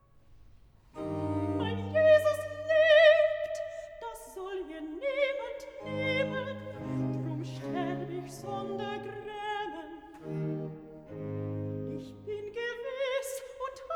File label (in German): Recitativo (Sopran); Cantata, BWV 145 'Ich lebe, mein Herze, zu deinem Ergötzen'; 'Mein Jesus; Bach; Eisenach; Easter Mon & Tue